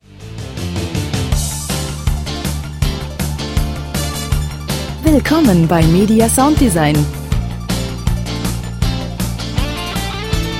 rock immediate action

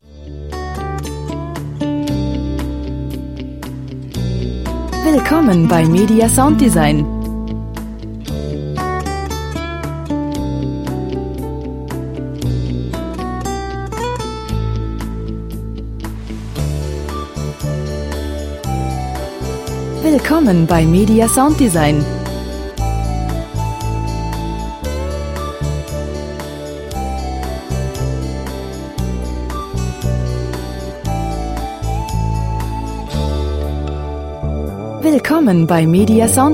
pop

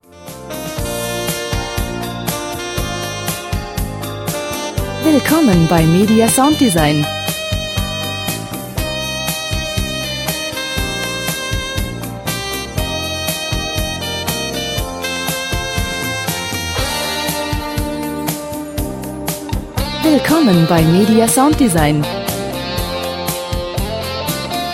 jazz

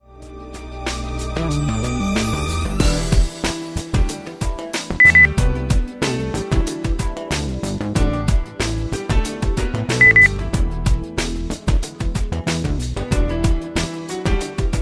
lounge

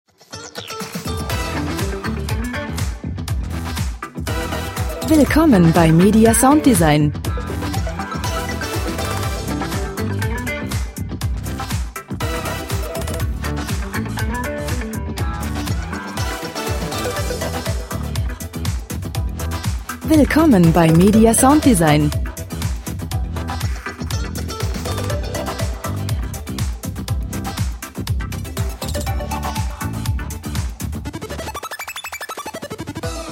groovy funky

